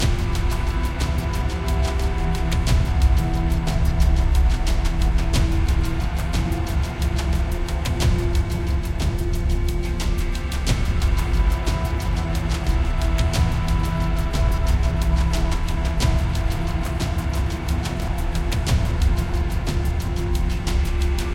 Combat High Loop; assassin s creed chronicles india; First Civ

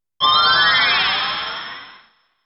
PC Skill Invoke Sound; dot hack; Game Music Perfect Collection

